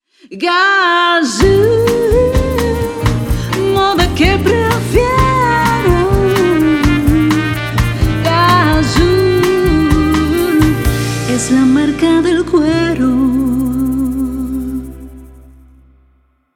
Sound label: jingle